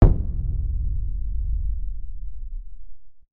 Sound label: Boom